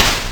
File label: Snare Sin; Discs Of Tron